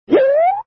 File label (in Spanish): Cartoon Sorpresa; Fernanfloo Saw Game Online